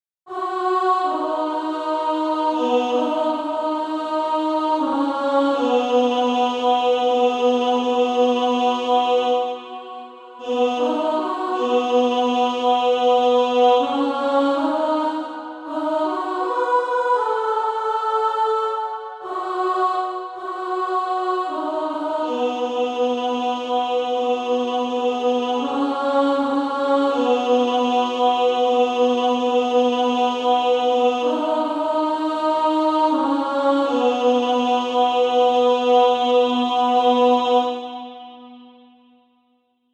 Female Choir

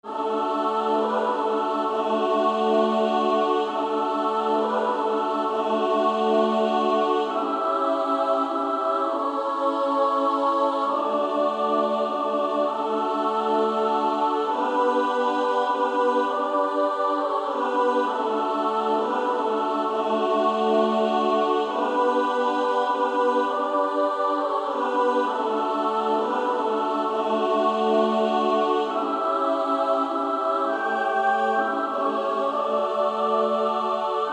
Female Choir SSAA; Silent Night

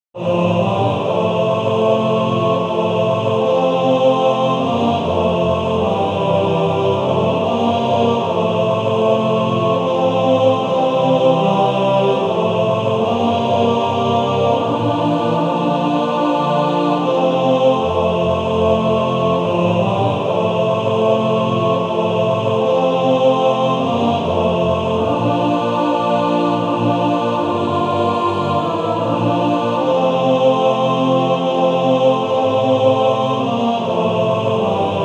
Male Choir; Just as I am; TTBB

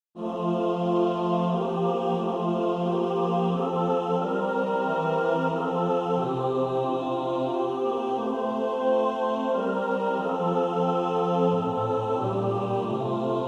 mixed; Our great savior

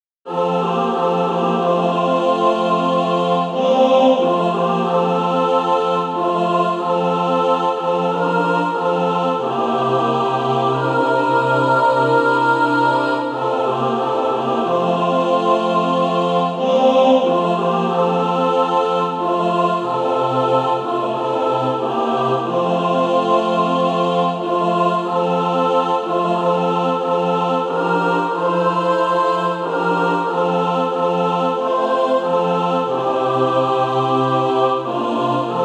mixed

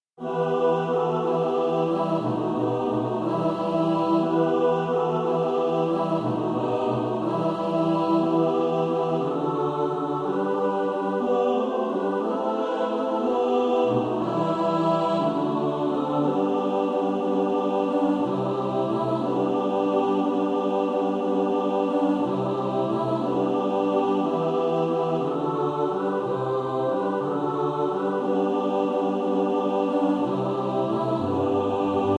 We three kings mixed

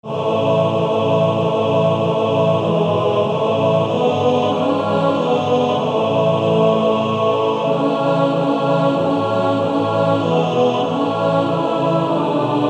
Male Choir

